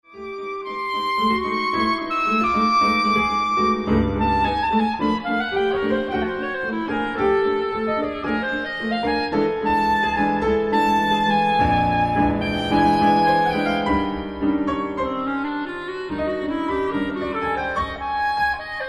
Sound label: B Flat Clarinet and Piano; II. Aria; Orpheus Singing; virko baley; multiple personae; the family of clarinets